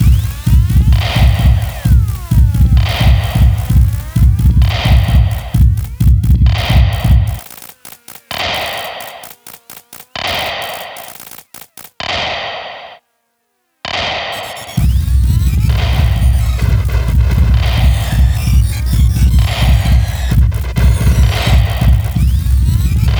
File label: Sine Wave Project